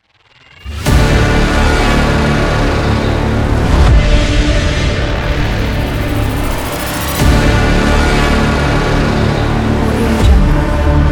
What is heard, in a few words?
aggressive trailer intro